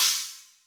Min China, Yamaha DTXpress Cymbal

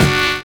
HIP SAX; Univers Sons

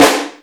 BIG THING, FM DRUMS; East West; Global Sounds

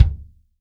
TIGHT KIK, TIGHT KICK

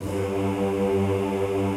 G1-R, Classical Choir; Best Service; Extended Classical Choir

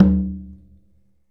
Conga Slap; Essential Percussion

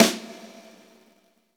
SNR, Reverbs; Club-50 - Foundations Roland; Verb 6

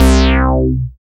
BAS MKS-50, Roland

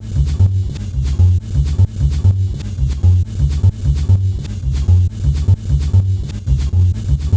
Bas Fx Loop 130; Music-Fx Loops 130; Equipped Music; Loungin House

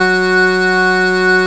SIEL, String Machines

